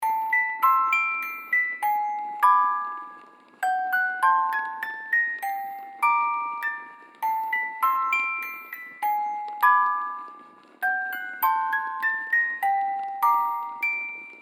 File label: Eerie music box suspense horror loop